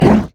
ancient golem effort; LoL; monsters

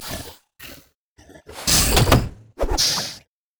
lizard elder angry, monsters; LoL

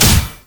fracture; LoL; skarner

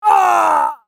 dying; masteryi

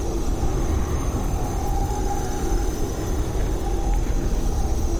desecrate loop; LoL; trundle base

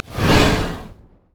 flame breath human; LoL; shyvana

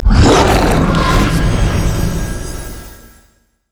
yeti blood boil; nunu

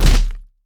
wolfman vs stone; LoL; warwick

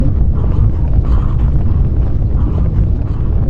enrage loop; LoL; malphite

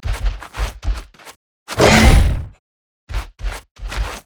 emote taunt; sejuani

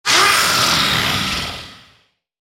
ultimate effort; Cassiopeia; Character Voices - English

